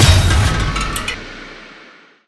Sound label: fx cinema